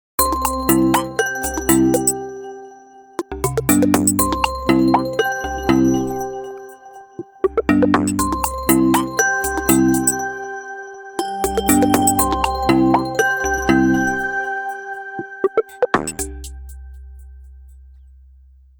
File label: Swish, phonetones Nokia N8